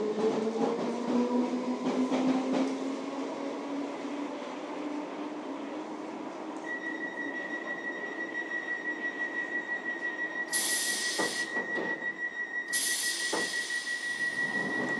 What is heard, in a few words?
piccadilly line train enters tunnel east of baron s court